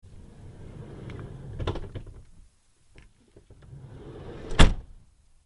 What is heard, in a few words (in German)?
bustuer auf und zu